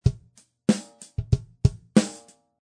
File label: Smack Funk Drm; Loopology; Get Dirty